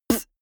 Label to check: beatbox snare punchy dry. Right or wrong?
Right